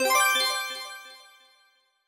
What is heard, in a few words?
accent brilliant high fast